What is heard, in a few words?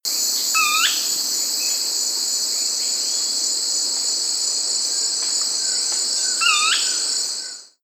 Brownish twistwing; پرنده تابیده بال قهوها